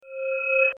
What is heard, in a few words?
swip; actmod